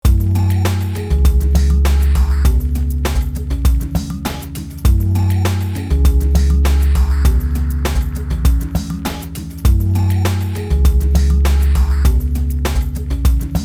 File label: DOWN TEMPO